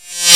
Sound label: BEEN REVERSE; AKAI S-Series CD-ROM Sound Library VOL-1